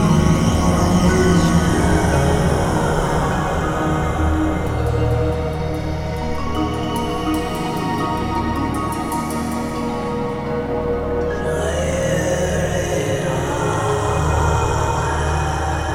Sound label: Synvoxperc Scape